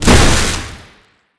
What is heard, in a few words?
electric lightning blast